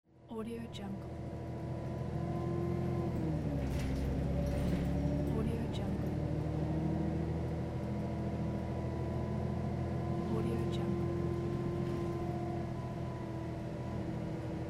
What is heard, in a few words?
inside a moving bus ambience